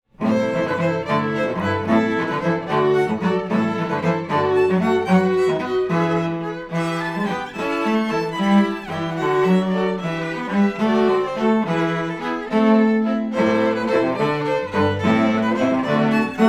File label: String Quartet